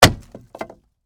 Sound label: Impact Wood Hit, Sledgehammer, Debris; Hammers (Sound Effects:Tools); BlastWave